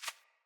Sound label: Hare Footstep; Foley